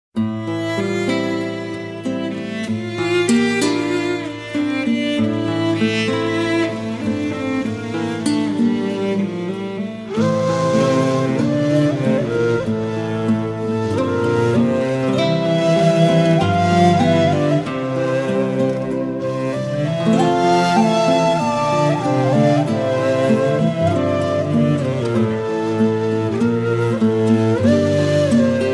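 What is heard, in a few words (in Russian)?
klassicheskaya melodiya, classical melody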